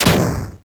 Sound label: Weapon Liberty Launcher Explosion; Warframe; Caliber Chicks Minigame; Weapons